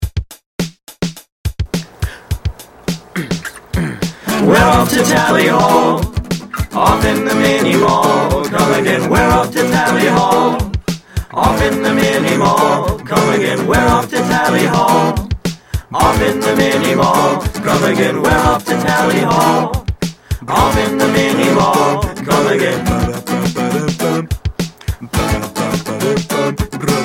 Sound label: Acoustic